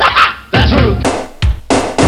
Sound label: NOISE HITS; Kid Nepro - Elite